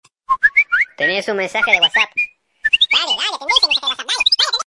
assobio whatsapp acelerado

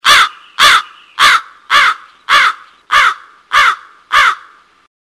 corvo, barulho de corvo

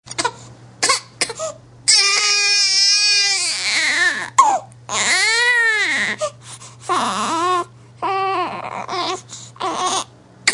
bebe chorando muito

